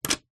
household mains plug adapter australia to uk unplug